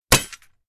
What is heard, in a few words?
Medieval battle axe chain armor hit